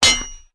Sword Ding; Link's sword hitting a wall; The Wind Waker

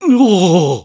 Worried; Zelda

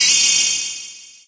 Transform Finish; Zelda; Sheik

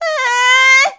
Blacksmiths Wife Call; Blacksmith's Wife calling for Gulley

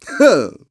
Huh; Ghirahim: "Huh!"; Skyward Sword

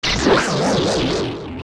Die; Shell Blade

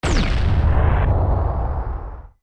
Collide; Goddess; zelda; OOT

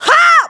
Smash Down; Toon Link; Link's downward smash attack shout; Zelda

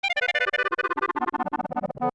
Die; Mascot dying

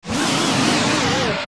Hit; Bongo Bongo; zelda; OOT

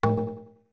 Notes Drums A short; Goron Drums (short)

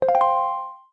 Textbox Close; Close a text box (also selecting 'Yes' to a question)

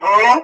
Eat; Boko Baba

